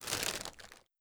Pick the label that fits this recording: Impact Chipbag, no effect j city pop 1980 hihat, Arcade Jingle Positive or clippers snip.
Impact Chipbag